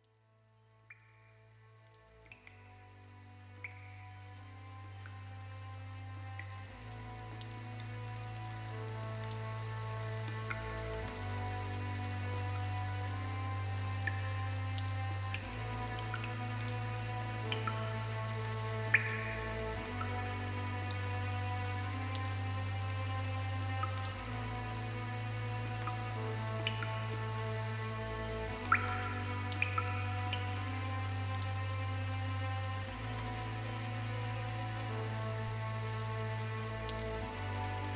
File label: mysterious place, 暗い; ファンタジー